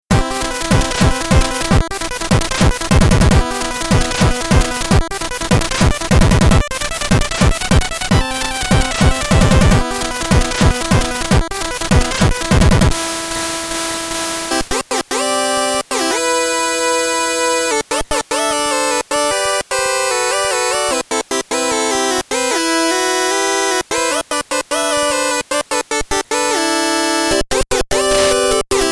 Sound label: white noise dubstep